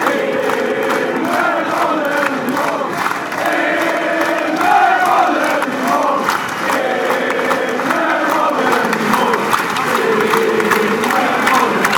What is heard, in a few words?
ball goal fanchants, sweden